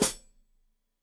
Hat Foot, Hi-Hat, Cymbals Stereo

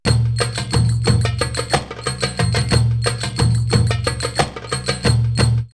Telly Dance 90, drumloops World Ethnic; X-Static Goldmine

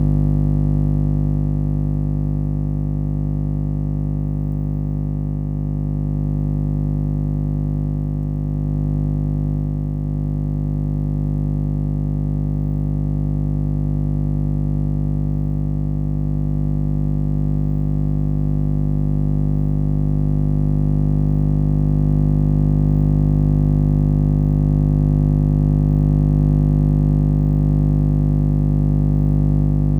Electric motor Industrial drill Drone; Pole Position Production; The Electromagnetic Library; Priezor

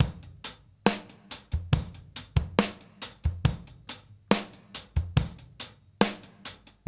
GRV 70; AMG Gota Yashiki Groove Activator

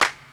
Clap Break; Lost Tapes From Mars; Clap & Rim

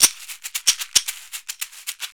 Caxixi Beats; Roland LCDP14 Africa VOL-2

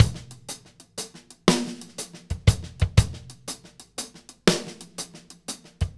6/8 Loop, Drum Tools, Laid Back

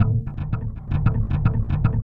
Processed Electric Bass

